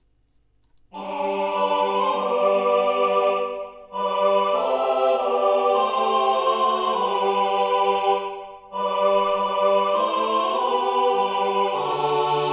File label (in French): Come again tutti, Chants